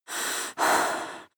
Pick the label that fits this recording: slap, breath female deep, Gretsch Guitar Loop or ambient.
breath female deep